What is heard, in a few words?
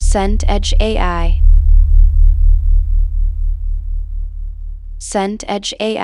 noise brown